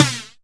Flange Snares; Percussion; Best Service ProSamples vol.37; Dance Synths

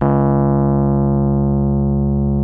RHODES C#1; Sonic Reality - Vintage Timetraveler

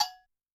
Agogo; Yamaha DTXpress-II; Perc

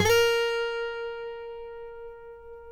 COLLINGS A#4, Guitars; Spectrasonics; Hans Zimmer Guitars VOL-2; FNGSTYLE PFM

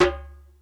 PERC, ETHNIC DRUMS; Crypton - DrumBase5000

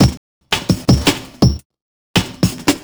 Break, Drum N Bass Percussion

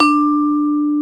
D3 vibe, vibraphone; InVision Interactive; Lightware VOL-1; Instruments & Percussions

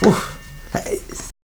VOX OOH; Roland LCDP11 Africa VOL-1; Berimbau; Berimbau Phr

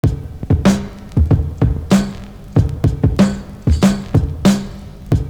NO HAT; Univers Sons

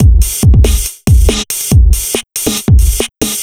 Noise Beat; Drums & Drones

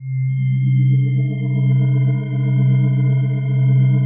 digi pad, Pads

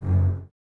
BSS F#1, String Ensembles, Basses, f Legato; Miroslav Vitous; 90 s Sample CDs

